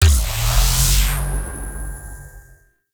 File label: Fast Travel Marker Select; Open World Fast Travel